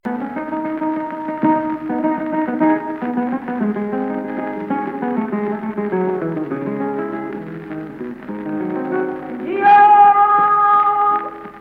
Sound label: Fandango; del Corruco de Algeciras